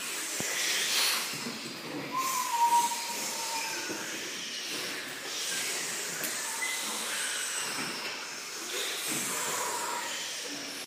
vent

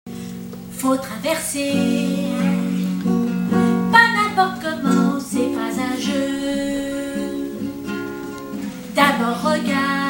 chanson de boum boum pour traverser la rue

